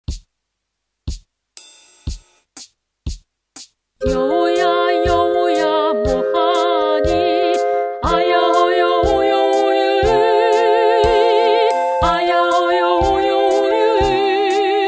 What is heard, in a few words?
korean arirang vowel song